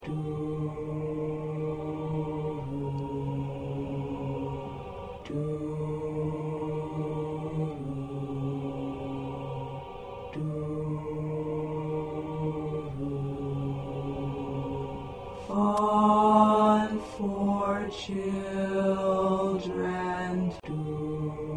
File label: Bass; holiday songs